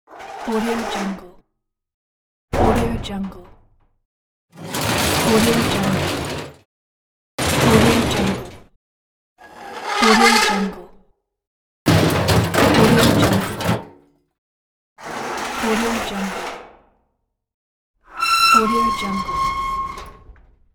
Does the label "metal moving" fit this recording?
Yes